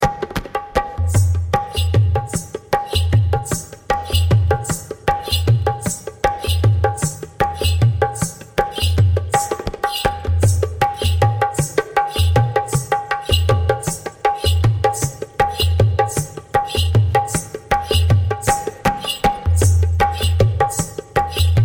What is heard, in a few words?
NEWARI PERCUSSION